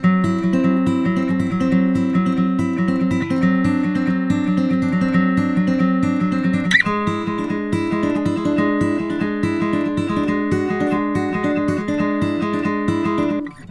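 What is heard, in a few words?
Country Guitar (Chorus Sec 1); Digidesign; Pro Tools Essential Loops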